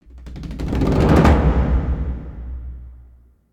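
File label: Crescendo Bucket Roll; Brand X Music; Brand X Music Catalogue - Editor's Essentials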